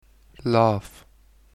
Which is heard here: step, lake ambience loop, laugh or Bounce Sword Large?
laugh